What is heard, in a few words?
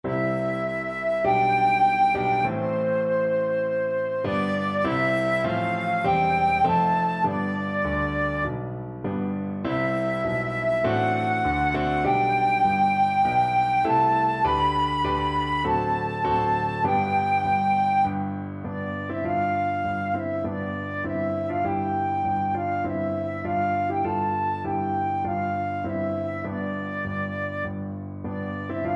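canadian national anthem, flute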